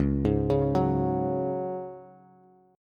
Db Suspended 4th Db, Bass; arpeggiated

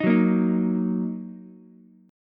Eb Minor Eb Ukulele; Ebm strummed; JGuitar

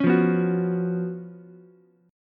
C sharp 11th C sharp, Ukulele C6; strummed; C#11 Chord; JGuitar

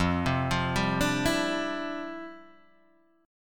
D Minor 11th F

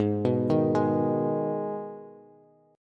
Ab Minor Ab down Bass; Abm arpeggiated; JGuitar

